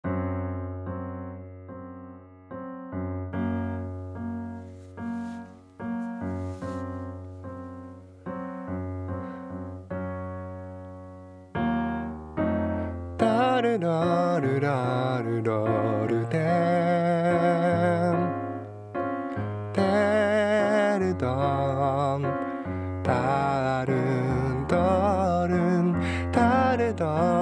new key

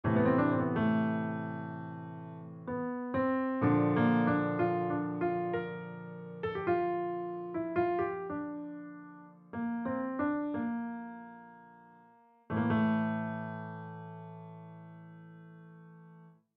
phrygian

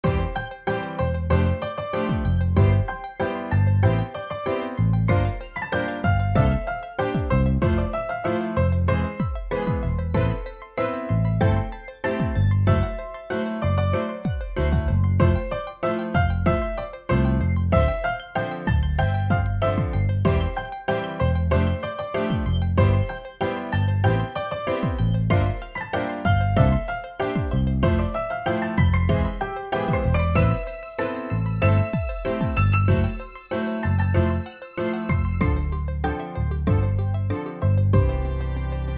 kick

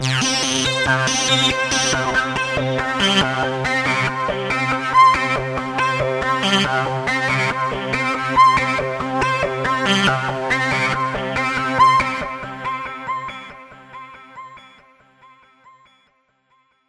tecno

